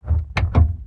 razeni; Mafie 1

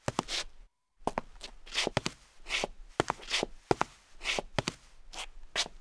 kulhá; samkulha; Mafie 1

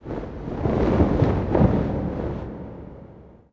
vytah prachy; Mafie 1

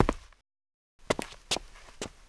výstup z auta; Mafie 1

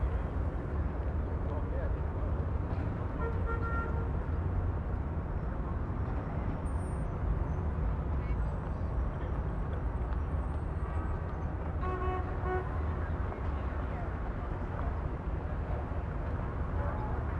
mesto in bar; Mafie 1

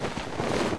z kapsy; Mafie 1

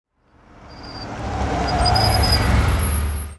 brzdy; Mafie 1

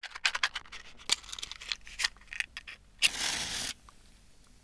sirky; Mafie 1

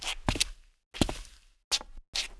kroky; Mafie 1

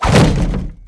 auto rana; Mafie 1

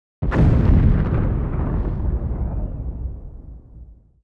vybuch; Mafie 1

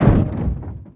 najezd; Mafie 1

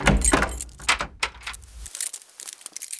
zamykání; Mafie 1

